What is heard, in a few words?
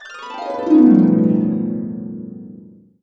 B, Harps